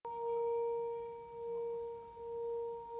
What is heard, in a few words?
singing bowl